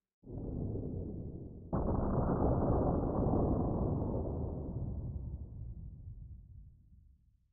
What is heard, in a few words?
spooky ambient sound